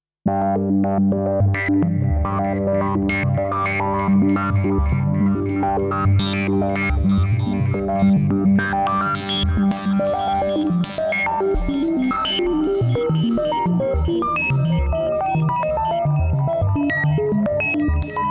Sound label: MS20 random pitch